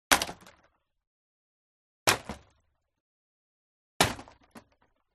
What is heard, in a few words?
BOW & ARROW Impact Sweetener Wood Shatter Medium; WEAPONS